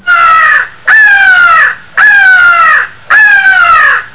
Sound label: rally, crow raven